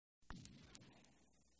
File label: Gun Shot